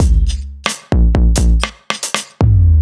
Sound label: Kit Wonk, 85bpm; off the grid